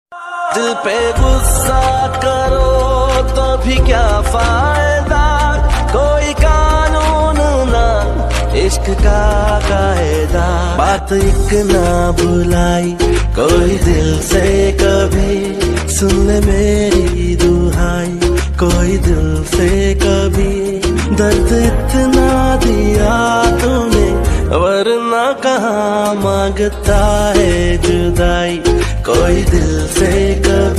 Sad Hindi Song Ringtone

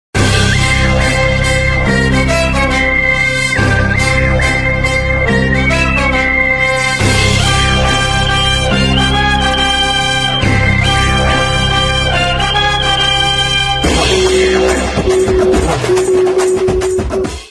Theme Music Ringtone

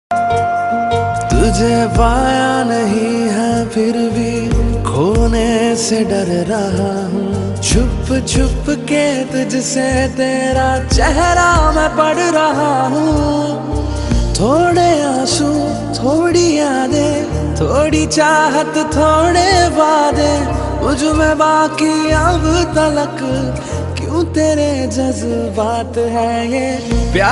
Romantic Love Song Ringtone

